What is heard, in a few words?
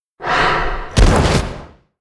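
giant lynx attack; monster; Senillinea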